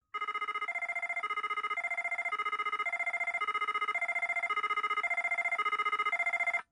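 UNITROL ULTRAHILO, emergency vehicle safety warning; 911 Signal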